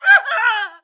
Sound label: normal dying; Emperor - Battle for Dune